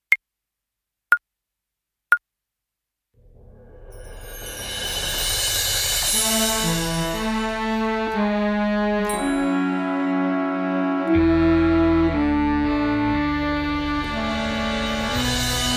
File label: Backing Track; Darwin Beat Festival Soloist